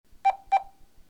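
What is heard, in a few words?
confirm, phone BlackBerry Bold 9700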